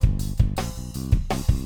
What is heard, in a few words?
music gross intro